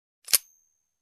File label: Gun magazine insertion